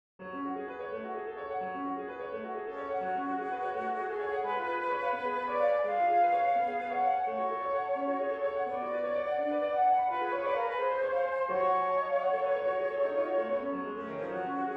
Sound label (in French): etude pour flute piano